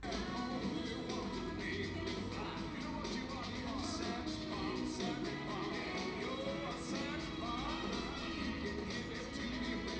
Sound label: music mix